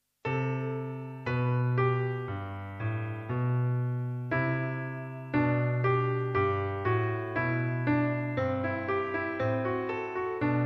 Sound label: piano